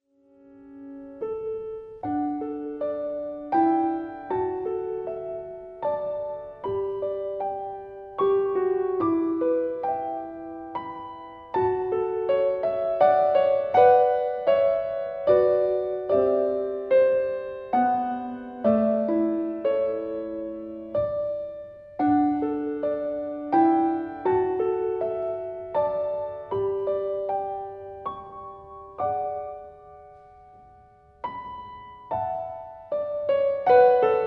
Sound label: Piano; Larice Music; Sweet Hour of Prayer